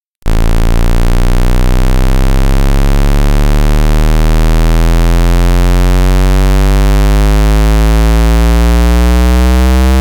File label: Analog Sweep